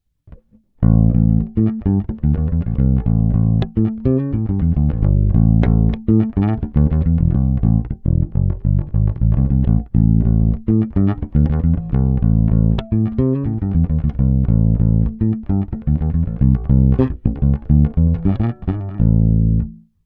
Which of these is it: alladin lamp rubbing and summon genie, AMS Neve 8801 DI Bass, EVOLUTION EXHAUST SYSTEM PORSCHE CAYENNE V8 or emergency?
AMS Neve 8801 DI Bass